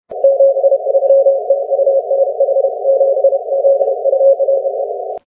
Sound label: 10m cw